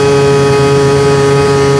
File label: rpm, sled; WhiteOut